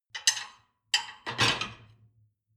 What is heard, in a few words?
dishwasher plate place